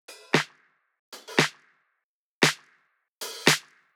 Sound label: Intro Loop 115 G Wet